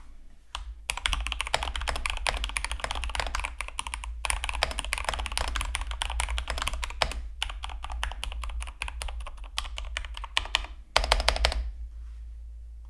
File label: keychron